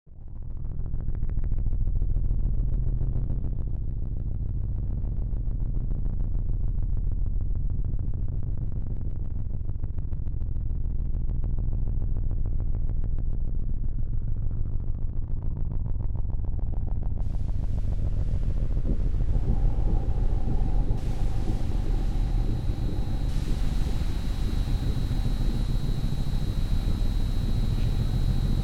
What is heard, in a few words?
Finale Soundscape